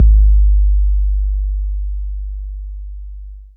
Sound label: K 808, KICKS TR 9+8